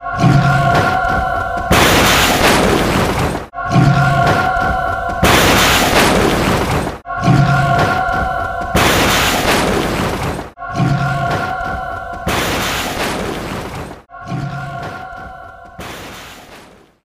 Car Gate; Alien vs Predator Requiem; PSP

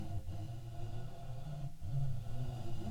Rope Med

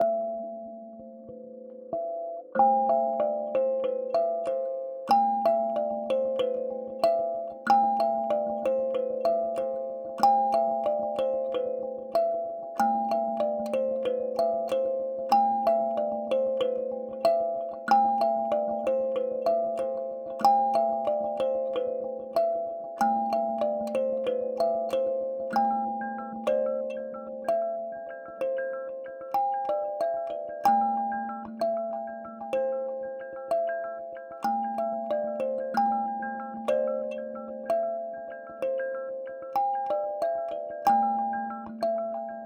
Kalimba

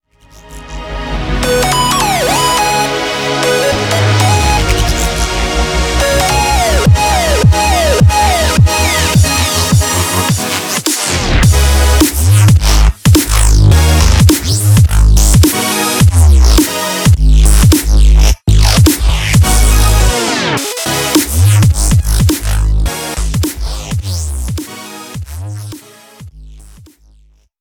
BGM xfade; Invaxion

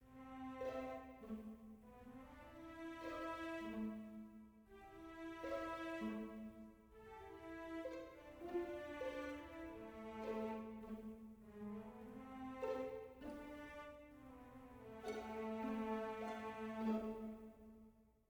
mysterious soft; Quivr; PS4 Windows gamerip 2016

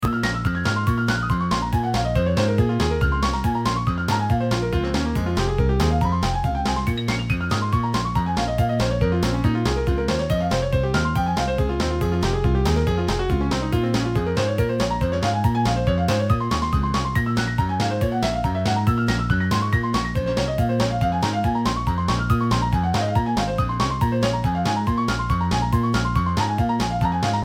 Funk

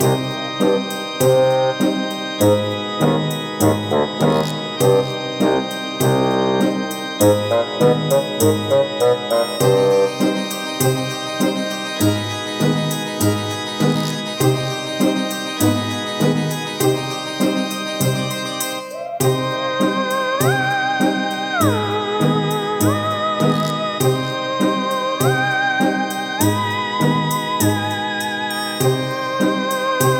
Halloween Music; Cooking Simulator

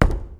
Ball vs Pin, bowling

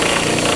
Servo Little LOOP

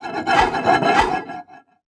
ui game plates

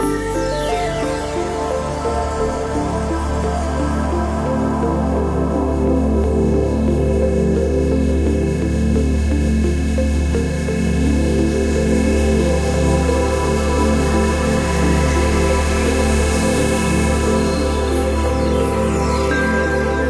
Redforest Ambient Loopb; Epitasis

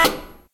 Servo High Little Stop; Puddle; puddle plus ios gamerip 2018

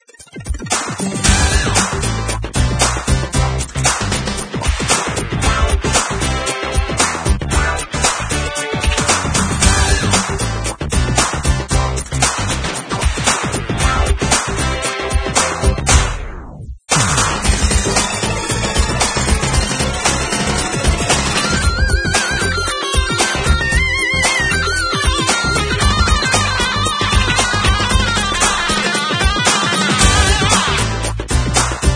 Menu Music; tiger woods pga tour golf ps1 gamerip 2000

